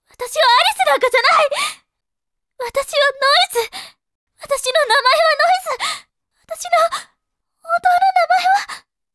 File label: noise